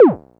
Perc Synth Raw

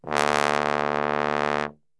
D 1 BASS TRB, Brass; Best Service; Brass Super Section

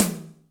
Room, Acoustic Tom

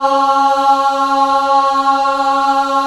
C#3 WOM AH, Choir; WOMEN; USB Soundscan vol.28; Acoustic & Synth; AKAI

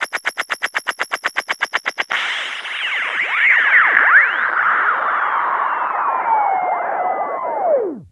mad radio, special FX twisted; USB Soundscan; AKAI

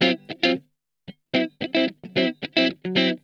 STEAMIN, Funk Guitar; Zero G - Funk Guitar

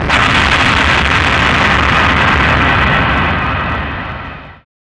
CYBERGUN R, Hard & Loud Techno

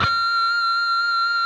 PRS PINCH, Guitars; Spectrasonics; Hans Zimmer Guitars VOL-1

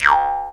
D JAW, Primitive Instruments

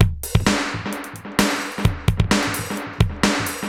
Space Echo HR-16B 130BPM

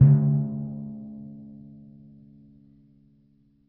C#2 p, Timpani, piano; PowerFX; Downtown Orchestra; scales

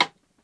Rim Natural Dark; Lost Tapes From Mars; Clap & Rim

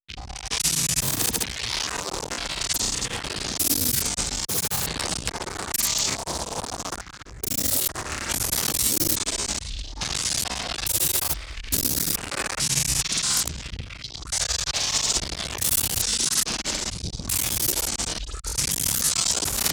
glitch

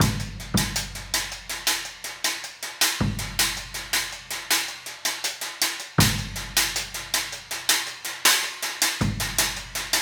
Trap Kit, Drums; Sonic Foundry (Sony Creative Software) - Essential Percussion

